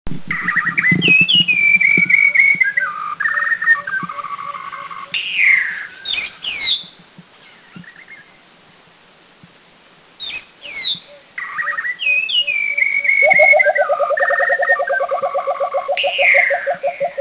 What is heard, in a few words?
White-browed Shama Philippine Coucal; Philippines